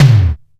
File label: DANCE SET, DRUMS